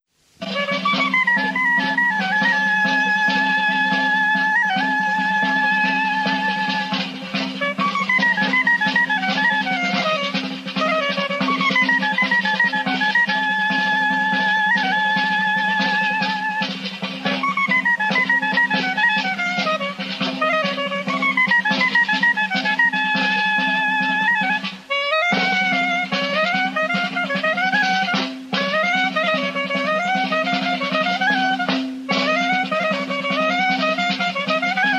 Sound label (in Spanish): La Charambita de Alaejos